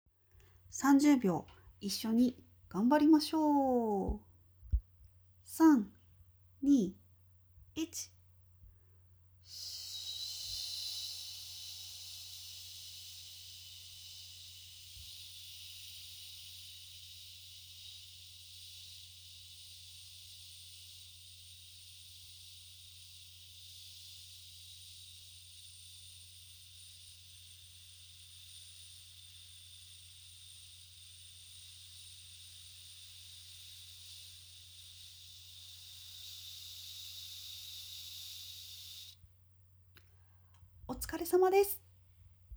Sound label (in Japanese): ブレスロングトーン